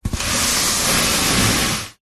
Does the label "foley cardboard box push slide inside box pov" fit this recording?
Yes